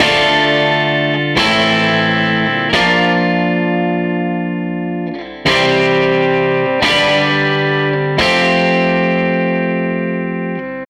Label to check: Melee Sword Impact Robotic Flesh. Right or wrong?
Wrong